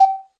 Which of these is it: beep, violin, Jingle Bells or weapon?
beep